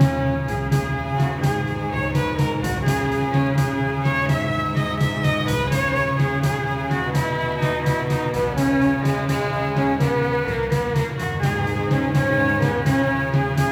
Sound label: tavern, music; XBOX; Knights Of The Temple 2